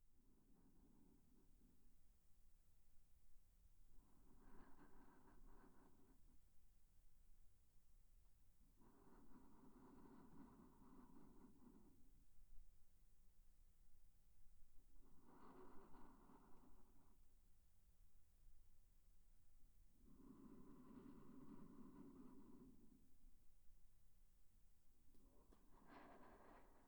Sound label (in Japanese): 睡息（右）